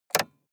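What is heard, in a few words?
sports car interior switch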